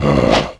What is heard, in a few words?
attack, zombie